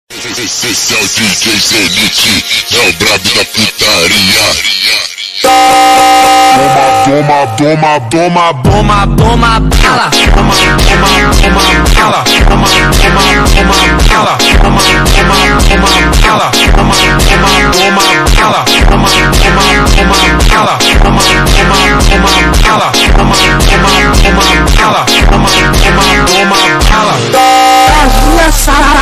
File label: montagem slowed reverb